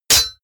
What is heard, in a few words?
sword hit metal